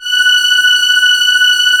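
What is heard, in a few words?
symphony strings F#3; Back In Time Records; Korg Universe VOL-1; P3+SYMPHONY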